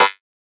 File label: buzz